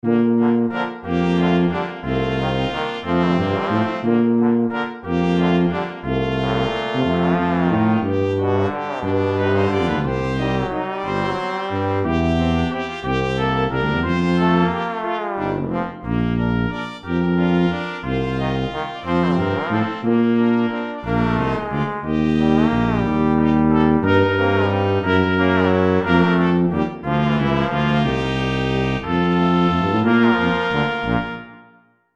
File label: midi